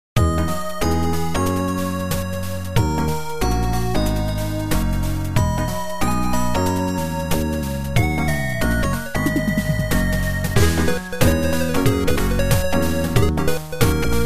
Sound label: FM synth music; Mega Drive's ym2612; Bokozuka Wars, arranged by Naruto